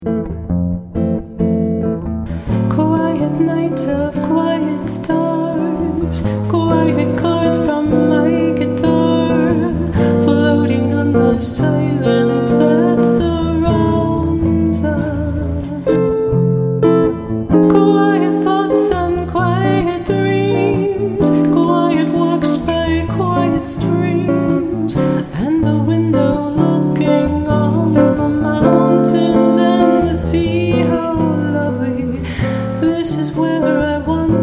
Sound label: with guitarist, vocalist; Variety Singer